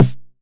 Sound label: 909 SD; Goldbaby Tape808 & Tape909; The Tape 909